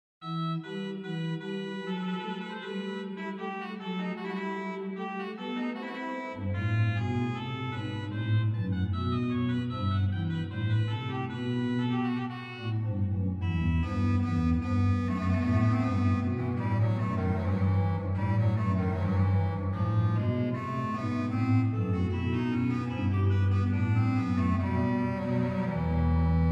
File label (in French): Dialogue Hautbois Cromorne, Organ; BeauvarletCharpentier; Gloria; Souvigny